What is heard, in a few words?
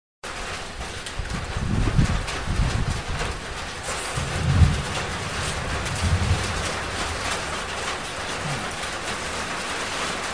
Rain on Tin Roof